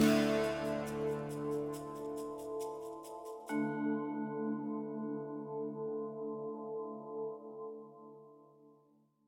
Low Intensity End tag; Cartagena

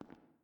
Footstep Glass

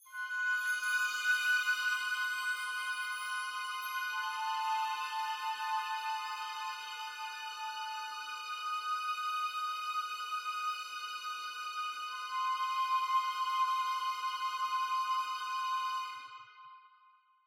Pedal; Bungalow Zlotzky; tintin reporter cigars of the pharaoh

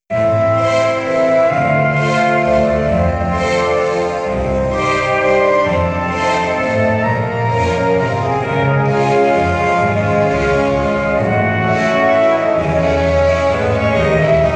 Air Recording (Gramophone); Origins

